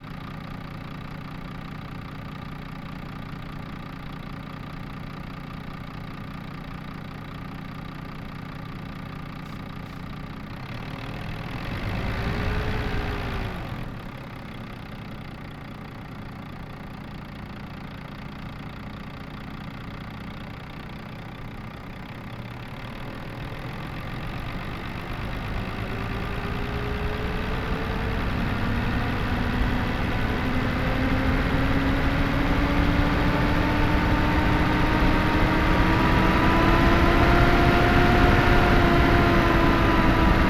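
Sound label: Volvo FM10 Onboard Drive Ramps Gravel Road Stop Off Engine Left; Volvo FM10 2003; Pole Position; PZM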